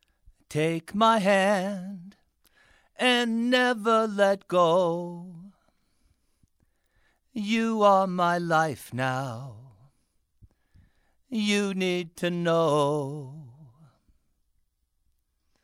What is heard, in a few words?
Male Vocals 58